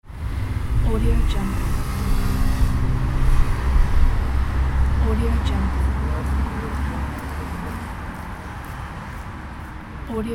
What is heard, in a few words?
city street; danielmix